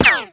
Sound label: Bullet Ricochet; Playable Tutorial Level